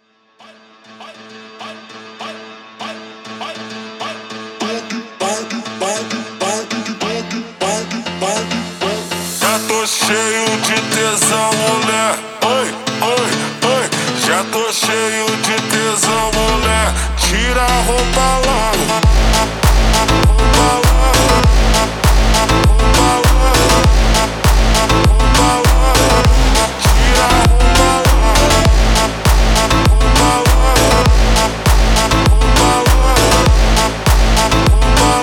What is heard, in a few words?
Funk slowed